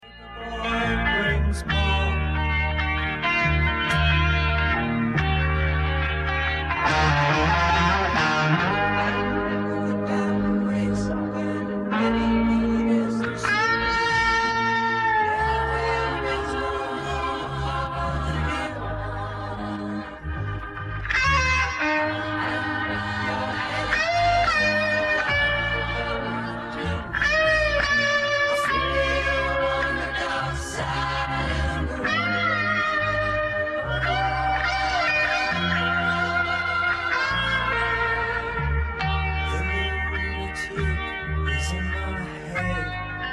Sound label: outtake with Colorsound Power Boost